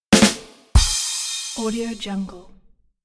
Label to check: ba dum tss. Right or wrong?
Right